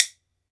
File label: WOOD BONE, Ireland